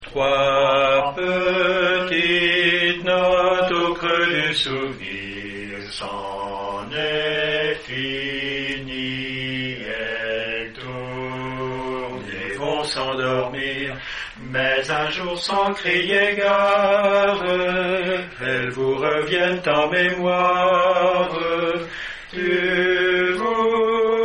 hommes; Basse

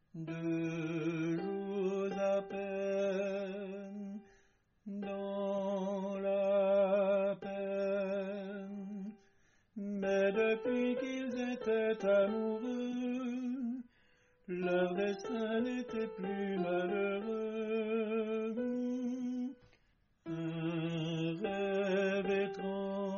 tenor

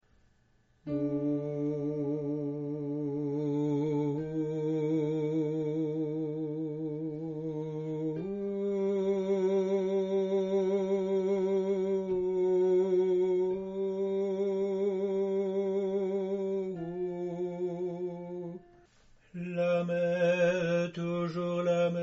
la mer toujours la mer alto; Alto tutti

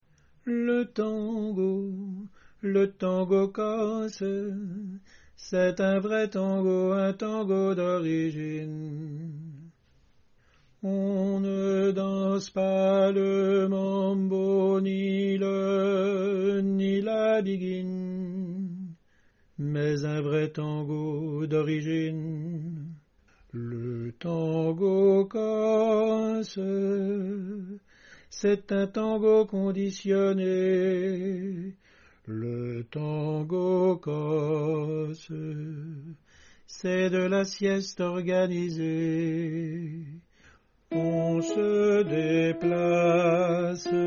tango corse alto

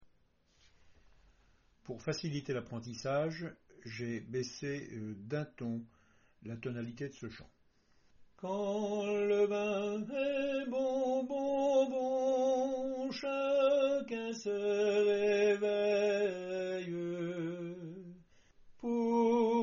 quand le vin est bon tenor

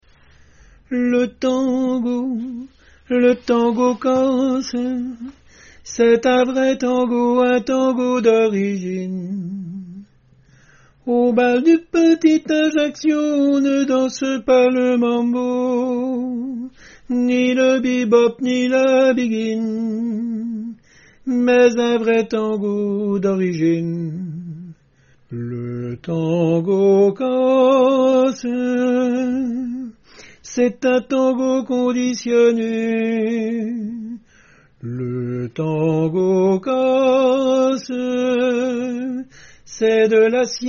tango corse soprano

